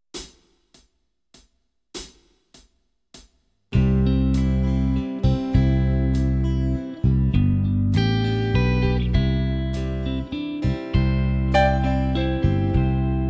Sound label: Backing track, keyboard; Kenneth Baker - The complete keyboard player